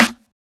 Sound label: snare; dough-samples; eddyflux